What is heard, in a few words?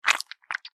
Horror Squish Suction Blood; Monsters